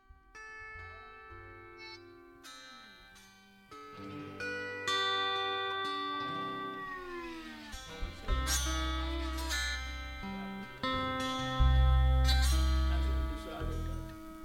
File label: Lap Steel